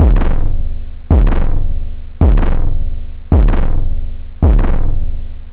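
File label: phase, techno bass drum; OctaMED SoundStudio V1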